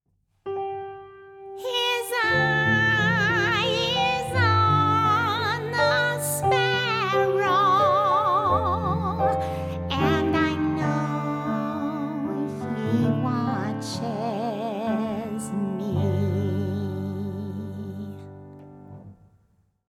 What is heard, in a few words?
Baby Twang